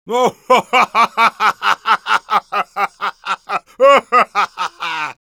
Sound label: Heavy laugh long, es